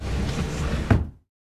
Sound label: Moving wooden heavy object